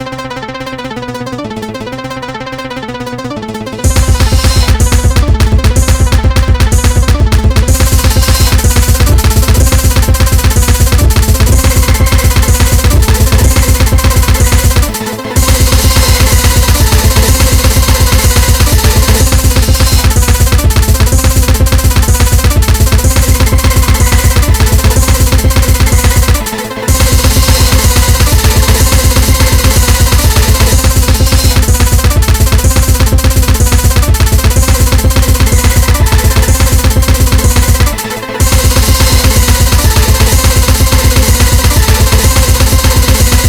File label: 500 TEMPO BEAT